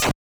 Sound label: break